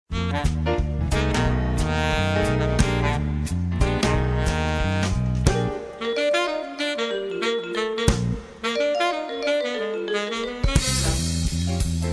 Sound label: swing